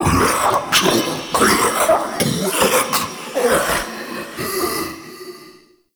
Male Vocal Stand Long; Characters; Enemy; Mockeries

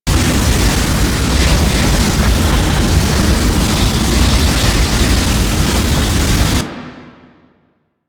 Quake Loop; Warframe; Warframes; Decree

